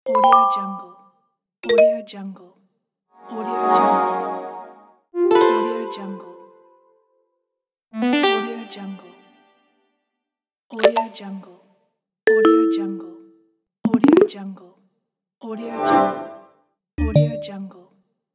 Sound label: notification